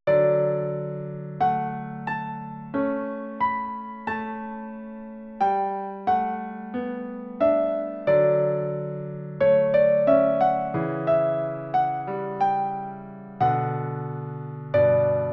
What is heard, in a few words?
Piano Music, A Peaceful Enchanted Forest